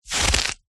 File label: foley footstep single boot wet slushy snow; zapsplat